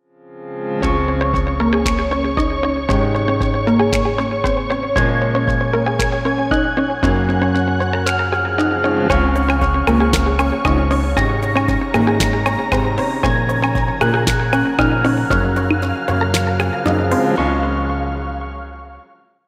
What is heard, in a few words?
Inspiring Ambient Background Music